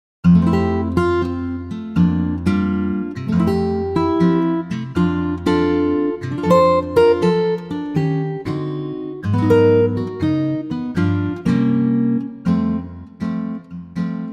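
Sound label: F acoustic guitar; key - F - vocal range - C to D; Gorgeous acoustic guitar arrangement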